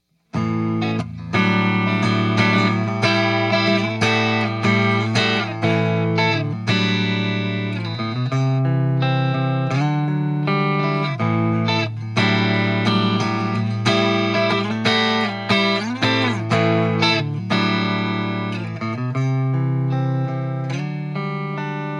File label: plexi clean; dark burst